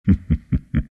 laugh; dota; earthshaker

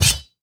metalblade pickup; ui; inventory

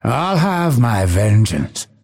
cast pain; dota; phantom assassin

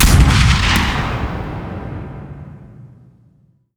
shotgun fire; weapons; dota; snapfire

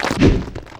preattack; tiny

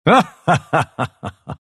pangolin laugh; dota

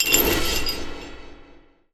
arc chains; weapons; dota; pudge

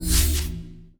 quit popup fadeout; dota

